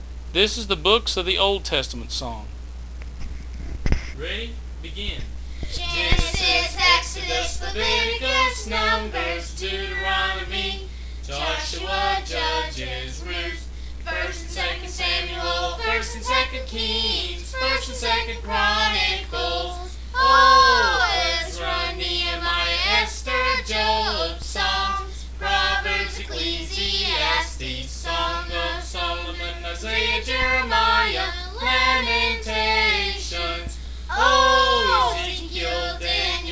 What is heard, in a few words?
Song Kids